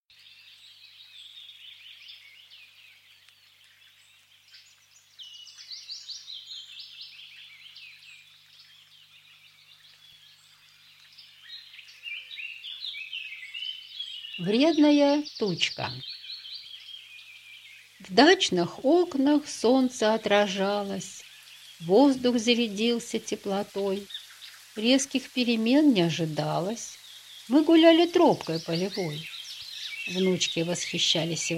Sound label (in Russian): Звук летнего дождя